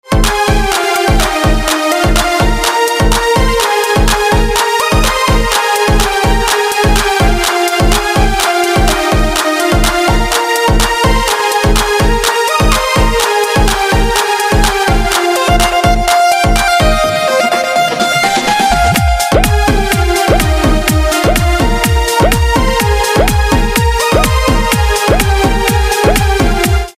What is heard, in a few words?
Sytrus Instrumental Ringtone; sytrus synth